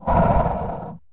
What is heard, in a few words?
Ogre; Sid Meier's Civilization 4 Complete; Beyond the Sword; FfH Age of Ice; units